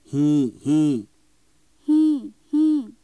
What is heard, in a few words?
horse